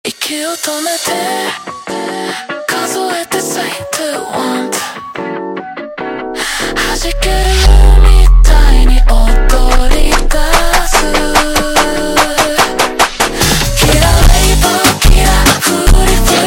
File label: Brega super Slowed, funk